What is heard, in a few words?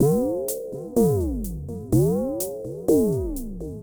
XTRA HOUSE 125